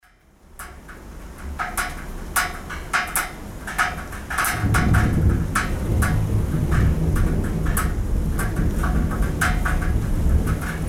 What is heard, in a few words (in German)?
Regen auf Metalldach Donner